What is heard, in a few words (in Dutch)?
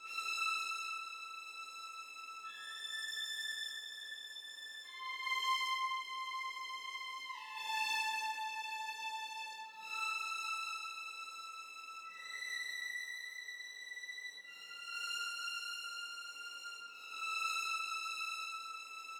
Violen, Lang; Toki Tori 2